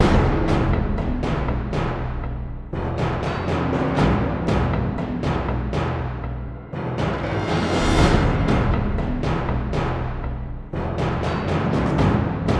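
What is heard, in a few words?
percussion loop; transformers war for cybertron gamerip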